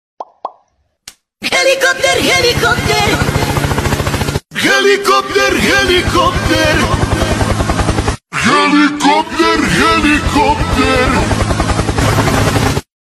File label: helicopter